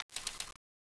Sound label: 357 idle squeeze